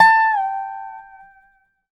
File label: dobro; DOBRO DOWN; Country